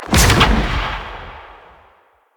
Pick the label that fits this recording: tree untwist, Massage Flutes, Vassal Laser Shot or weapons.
Vassal Laser Shot